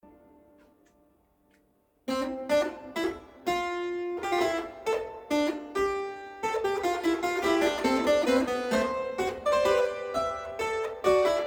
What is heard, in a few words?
fuge, Flemish harpsichord